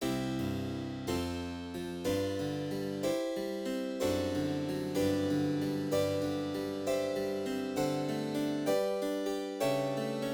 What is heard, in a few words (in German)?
Cembalokonzert